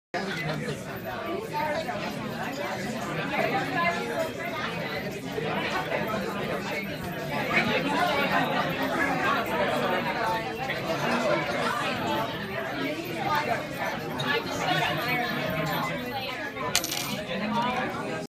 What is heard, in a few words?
shaking; puzzle